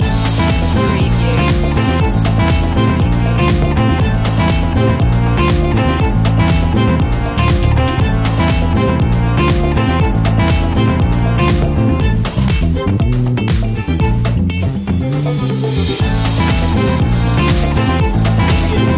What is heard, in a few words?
salsa funk loop